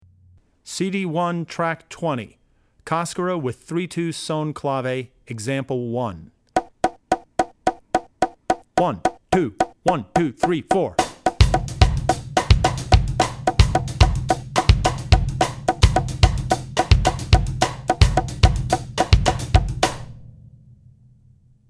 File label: Cascara (2-3 Son); Drummer's Bible Tracks